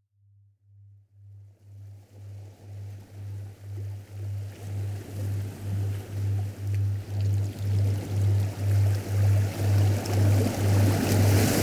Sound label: Ocean Waves Delta; sleep music